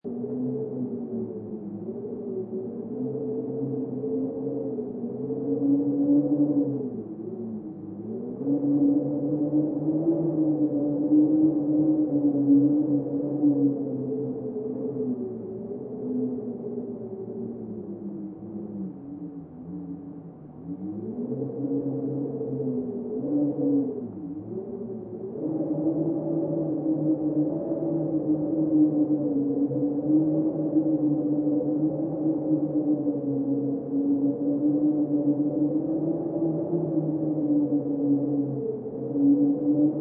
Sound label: Howling Wind Inside Perspective, Heard from inside cabin; an eerie, howling, lonely wind blows over the wastelands